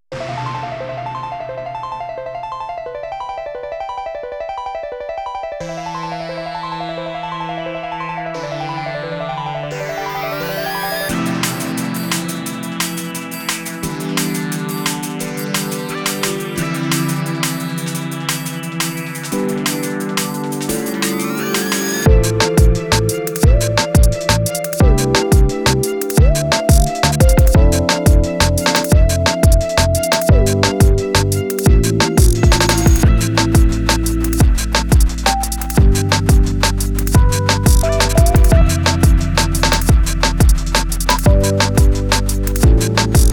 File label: Remix, Instrumental